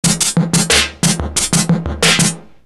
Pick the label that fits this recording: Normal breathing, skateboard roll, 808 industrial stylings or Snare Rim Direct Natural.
808 industrial stylings